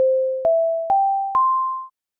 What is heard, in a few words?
ringtone